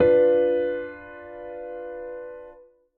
Piano B2

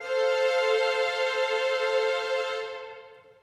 Strings F3

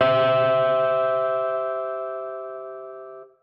Gtr E3

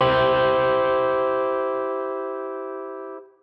Gtr C3

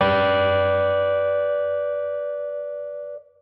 Gtr G3

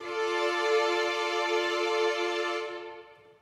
Strings D3

